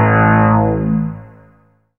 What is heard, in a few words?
Bass (lowmetal) (A); Sonic Foundry (Sony Creative Software); Ugly Remnants VOL-2; Basstuff